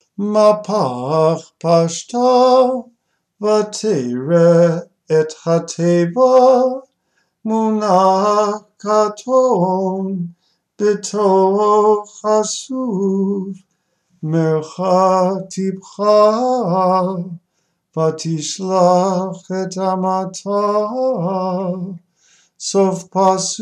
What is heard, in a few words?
Chanting Practice